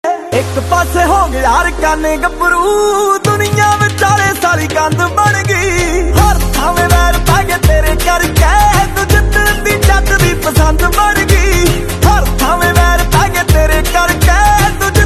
Ringtone

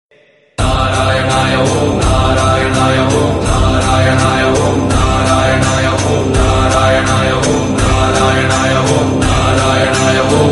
Narayanaya Om Humming Telugu Ringtone

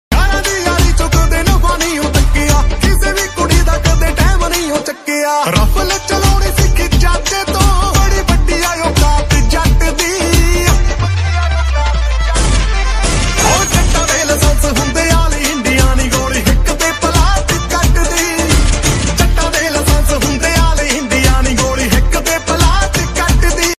DJ Punjabi Ringtone

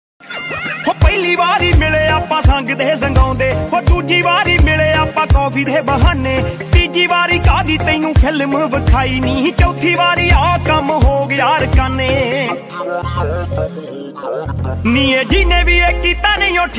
Punjabi Ringtone

